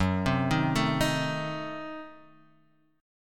F sharp Diminished 7th F sharp Guitar Half Step Down